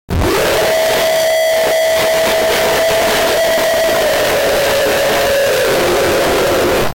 scream of hell

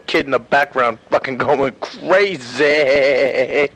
kid background crazy

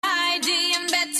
ugh; tank

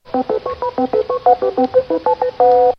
board fill theme; jeopardy

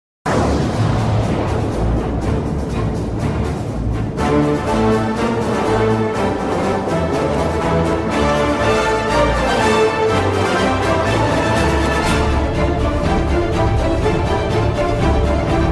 march; red alert 3

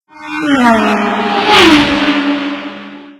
racing

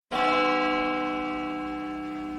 single bell

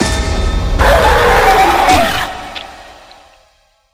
cartoon cat attack

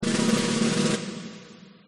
drumroll

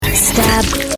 stab

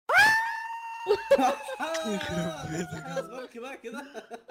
aaaaaaaaaaah

